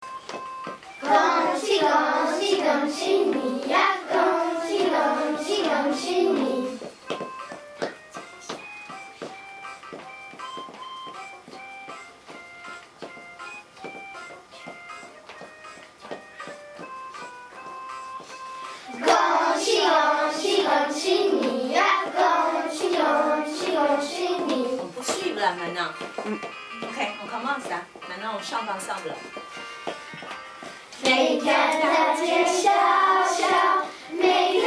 chant Felicitations

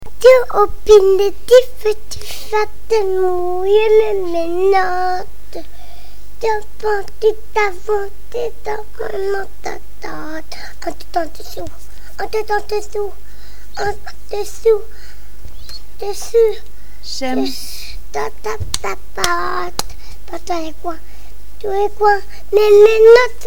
chanson pour se laver les mains

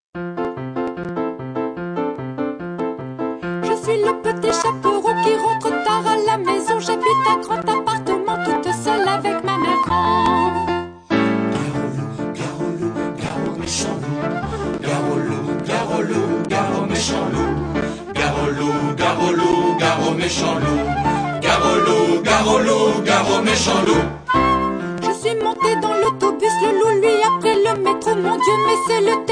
loup chant

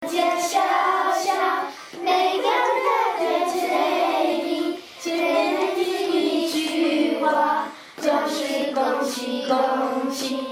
chant Felicitations

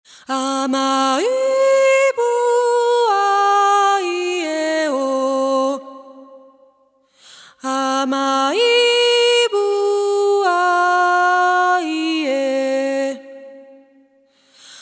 chant voix

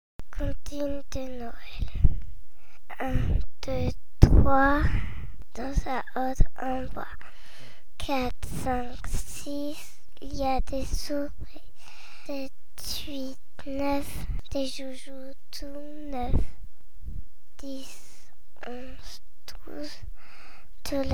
comptine de Noel

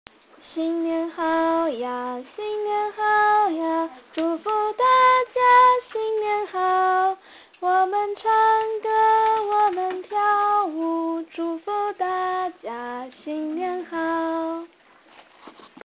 chant du Nouvel an en chinois

